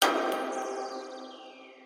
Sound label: iphone